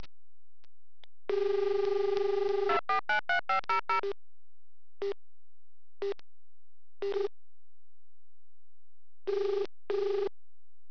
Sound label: ring